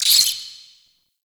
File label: Interior Spider Chatter; Duviri Murmur